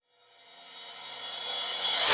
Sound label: Reverse Cymbal OS; Sonic Foundry; Rondo Brothers Trip-Hop Loops & Samples; In The Valley